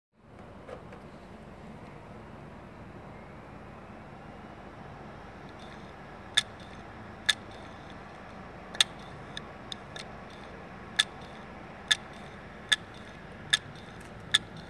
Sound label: Heads toshiba 2.5 2 TB, damaged hard drive sounds